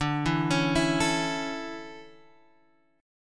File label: D Major 7th Suspended 2nd Guitar Open G, chord